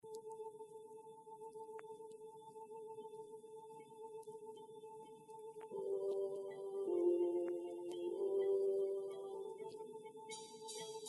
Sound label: Ska; RockSamples